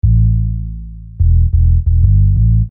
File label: Sine Bass